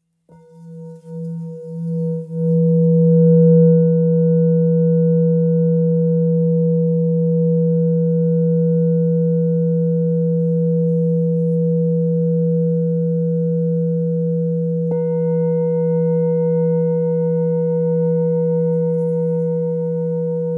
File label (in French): Bol super lune belier si